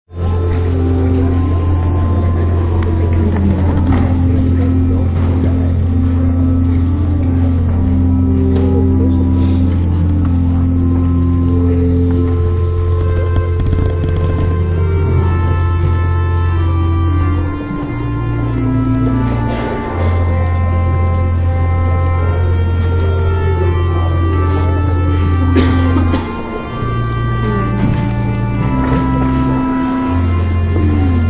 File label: Church organist plays Away in the Manger; Part of Churches (Sound Effects:Backgrounds)